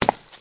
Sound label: stone, step